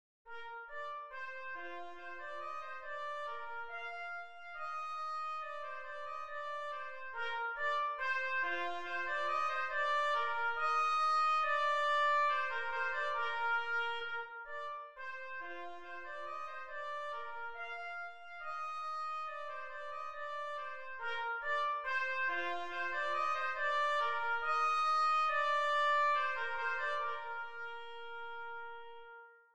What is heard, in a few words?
gavotte; telemann georg philipp